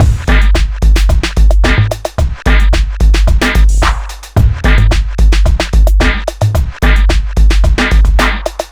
bubble but 110bpm

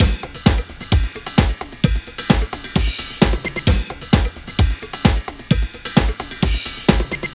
Drum Loops

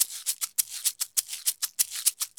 Maracas 100 bpm; Drums & Percussion; Best Service; Festa Latina VOL-1

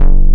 PULSE BASS

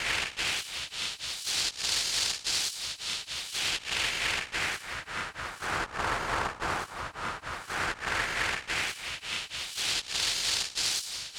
BRUSH RUG; Distored Reality

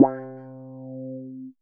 GUI MULT; EastWest; Dance Mega Hip Hop Electro

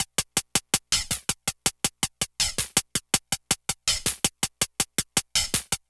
Tek Hat; Drums & Percussion; Electronic Point Blank

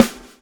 swing

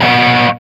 C 4, Soldano rock guitar; Spectrasonics; Hans Zimmer Guitars VOL-1

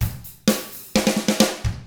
127 SURF ROCK

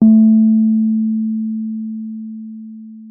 WURLITZER A3; Xsample VOL-1; WURLITZER B

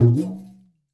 TALKING DRUM; Percussion; InVision Interactive; Percussion Slam

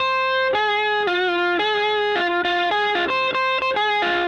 Raw Funk Guitar

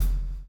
CHAIN; Spectrasonics - Backbeat

